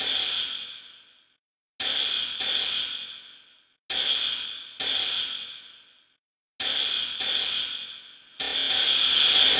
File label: Hats Reverb Groove; Sonic Foundry (Sony Creative Software); Loop Noir; Session 12 - Otherworld Journey